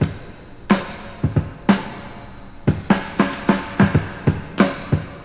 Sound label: girl disco, Drum loops Funky